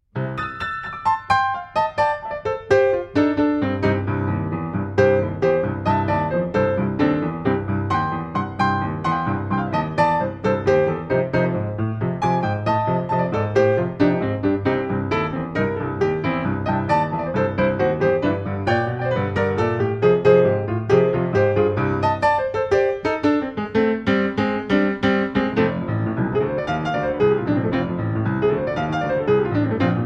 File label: Grand piano; P 159 Bora; PETROF